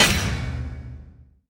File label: onslaught; heroes raelyn